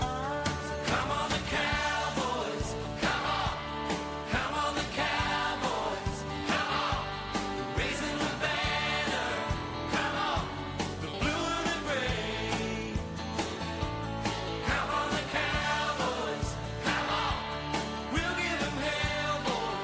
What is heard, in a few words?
chorus